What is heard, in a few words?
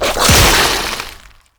Golem Gun Spit Attack Fire; Warframe; Plains